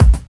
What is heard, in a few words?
KICK+HAT; East West; Phat & Phunky